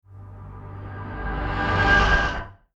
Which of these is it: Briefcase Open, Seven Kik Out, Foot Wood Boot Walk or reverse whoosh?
reverse whoosh